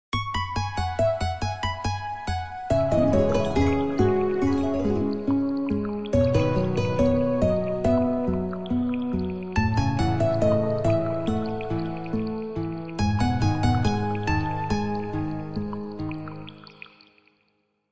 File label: Glide, ringtones; Lenovo; A328